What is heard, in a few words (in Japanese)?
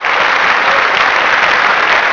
kansei, cheer; Nintendo; July 2020 Gigaleak